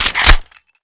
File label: Deagle Clip In; new weapons; deagle tiger; cstrike